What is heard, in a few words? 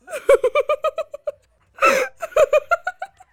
Girl Laughing